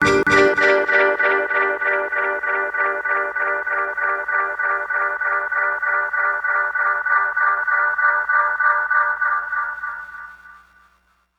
Skanks

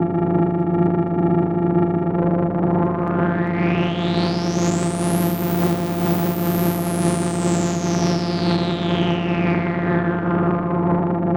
TEXTURE 125 F

